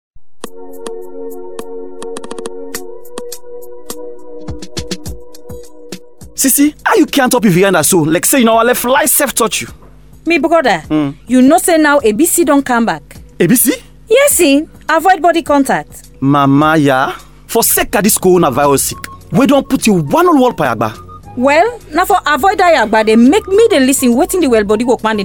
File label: COVID JINGLE; IRCSL